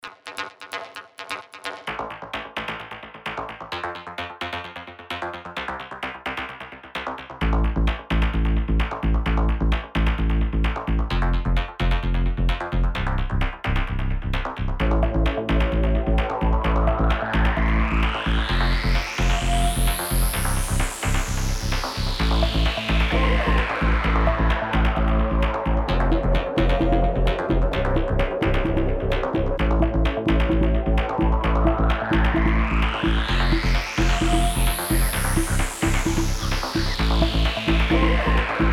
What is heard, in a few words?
Techno